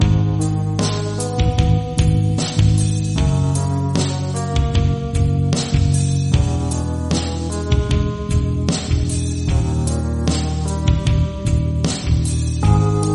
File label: ringtone